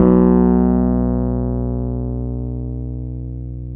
PNO, Wurly

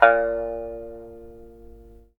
SAN XUAN A#3; Spectrasonics; Heart of Asia

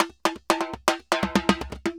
TIMBA, SALSA; Best Service ProSamples vol.14; AKAI

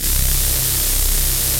TECHNO; Pro-Rec - Synthsonic; Z1 TECHNO

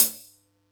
Yamaha EX-5

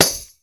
TAMBOURIN

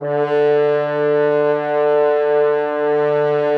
French Horns, D2

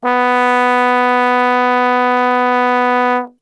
B 1 Brass Super Section; Best Service; TRMBSTRGHT; 90 s Sample CDs

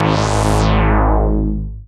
SYN RESO, Analog; Sound & Vision - Gigapack I CD 2 (Roland)

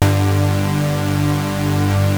Prophet 5 D3; Hollywood Edge - Giorgio Moroder Rare Synthesizer Collection

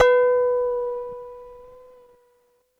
ASI KOTO B3, Yamaha VP-1, ASIA-KOTO; Music Store; 90's Sample CDs

